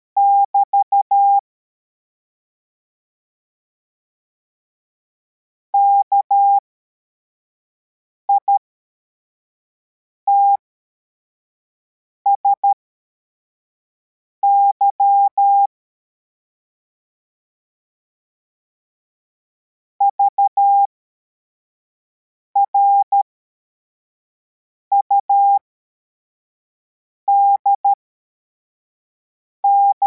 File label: cw, morse